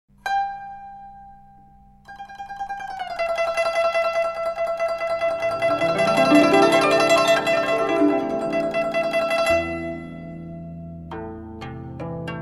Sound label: Gu Zheng; Khmer Sai Yoke